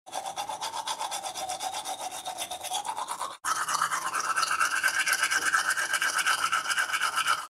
Brush Teeth

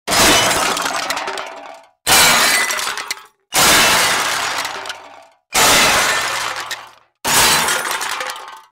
Falling Junk Noise

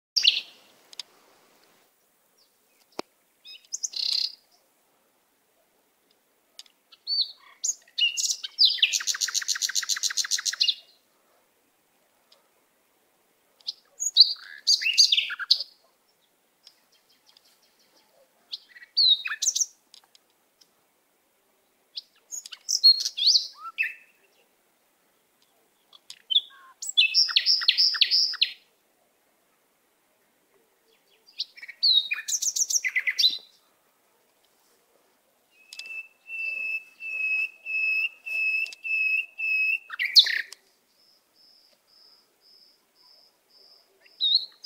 Natural Bird Sounds

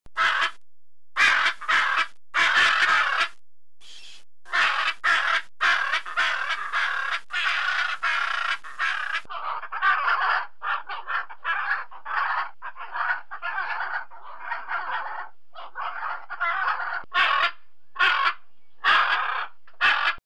Blue Amazon Macaw Parrot

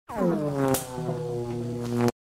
Buzz Drop Tone Electricity

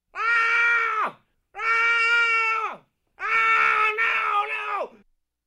Man Screaming Sound Effect

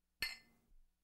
Glass Toast Clink

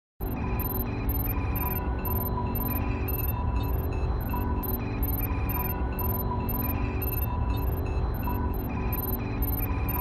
Spaceship Interior

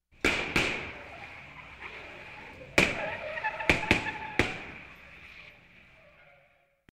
Gun Shots With Car

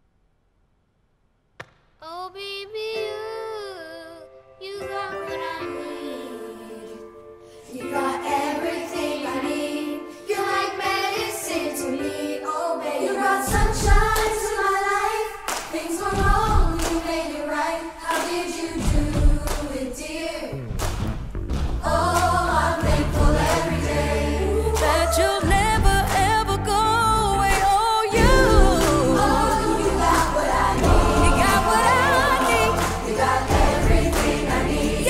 Commercial Ad Song

